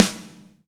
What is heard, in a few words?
Reg. Snare; Snares